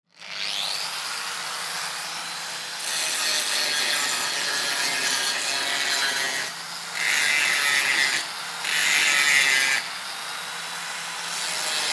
car workshop flex layout

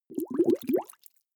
bubbles soft

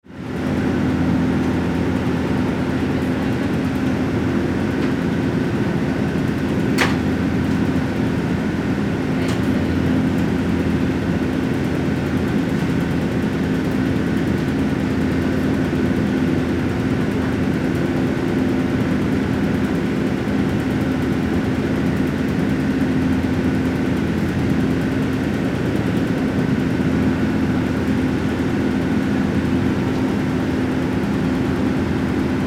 boat barge driving noise

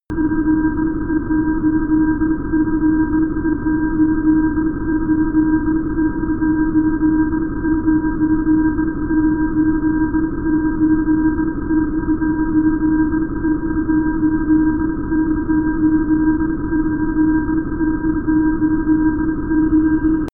space lost in vastness loop

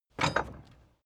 carriage hatch single metal

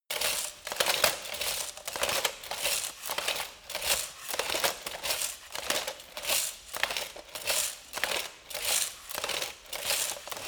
light walk sequence

